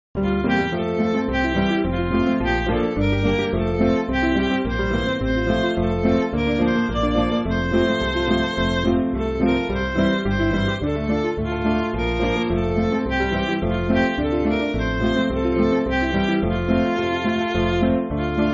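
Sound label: Midi, Music Backing Files for Churches; Noel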